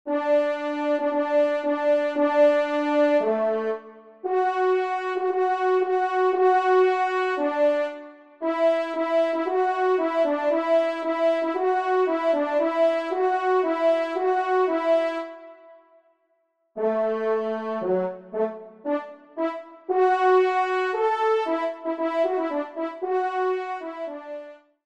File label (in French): Quatuor; 1e Trompe; Localisation : France; Dauverne; Quatuor N1